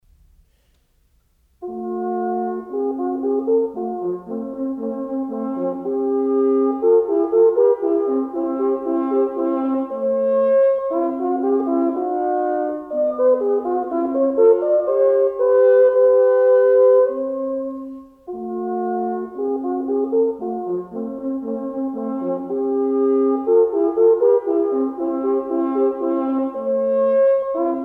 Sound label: Duos - Andante, Wind; Three (of 12) Duos; Mozart; Serenades and Divertimenti for Wind